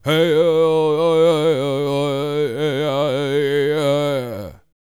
World Voices, CHOCTAW

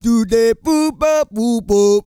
REGGAE, Vocal Planet World Voices

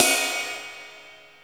FX CYMBAL

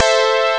chord; Amiga Soundtracker Sample Packs

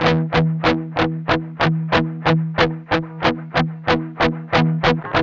MED ROCK 8TH F 3, Guitars; Spectrasonics; Hans Zimmer Guitars VOL-1; 102-MED ROCK

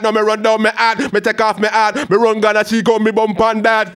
HAL RAGGAM, RAGGA-MUFF A maj

